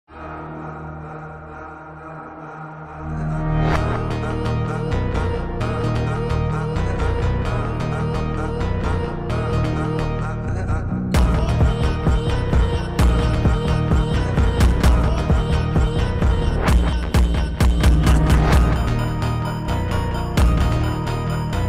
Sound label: Phonk Beat drift phonk